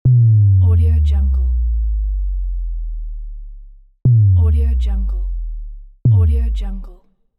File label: bass sine drop